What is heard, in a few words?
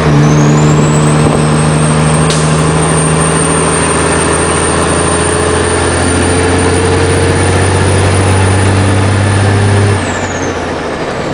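fourth cruise, car engine; tdmcars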